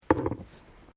KNIFE KITCHEN PUT DOWN; Various knives; Household

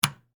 Light Switch Off, Hotel, Room; Foley; Household; Blast Wave

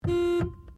CAR TOYOTA COROLLA HORN LONG INTERIOR, hatchback; 2009 Toyota Corolla hatchback; Cars (Sound Effects:Vehicles)

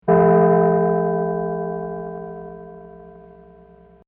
Gong Two Tone; Gongs; Musical

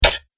Dagger Slashes Armor; combat; knife

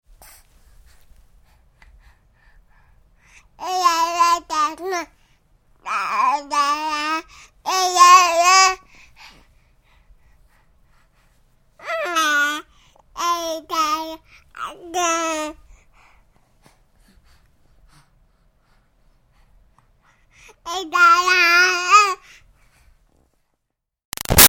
Baby 6 Month Old Talk Happy Long; Babies; Voice; Big Room Sound

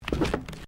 Footsteps Stairs Wooden Carpeted Step

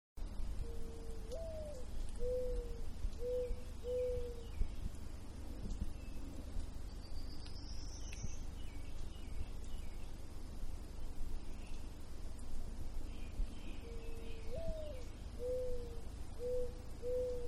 Owl In Middle Of Woods, Quiet Birds In Background; Manhattan Production Music

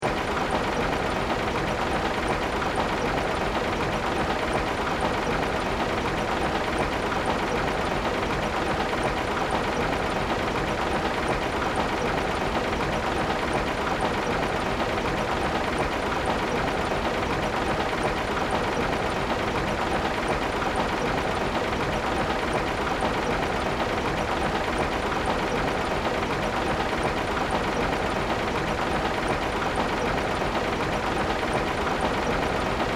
Steampunk Engine, Steampunk, Engine, Loop

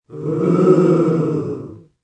Crowd Ooo, Small crowd, studio audience; Crowd Sound Effects

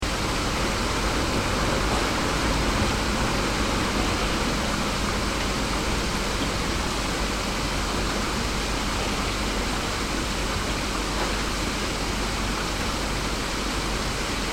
Rain Inner Yard Cars From Distance; Weather; Crafted Signals

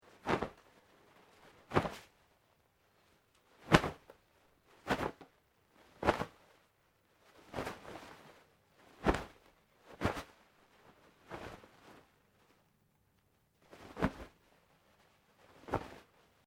Cloth Parachute Shake Sequence; Material components for constructing more complex sounds; Dressing

